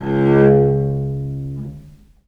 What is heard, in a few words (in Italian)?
C#1, Historical Instruments, VIOLE MUT; USB Soundscan vol.23; AKAI